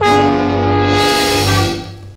suspenseful

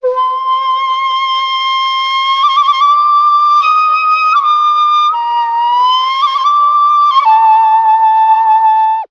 flute

